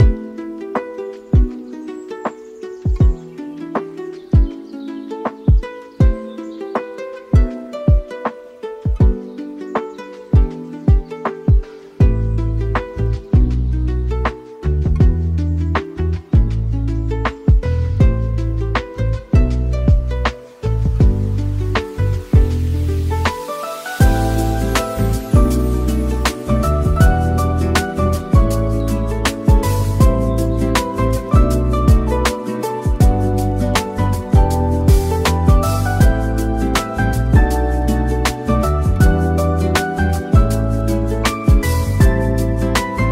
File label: Spring Bloom Beats, Lo-Fi Hip Hop; 春